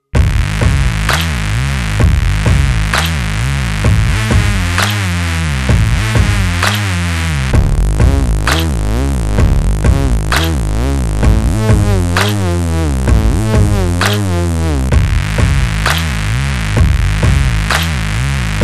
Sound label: Dark Dubstep